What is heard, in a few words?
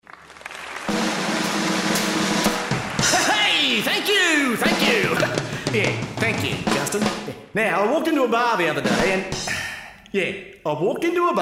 Drums Radio Commercial